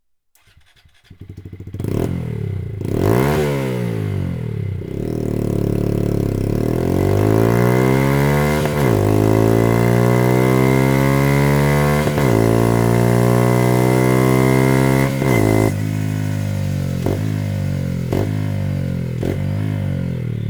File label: Slip-On